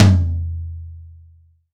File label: acoustic tom drum single hit f sharp key